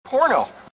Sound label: Hehe